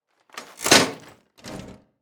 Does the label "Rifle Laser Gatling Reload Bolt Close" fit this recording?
Yes